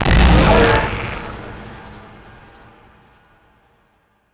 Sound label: damage; Barotrauma; Jove